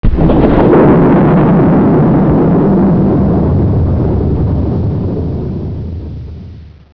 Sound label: miss; netpanzer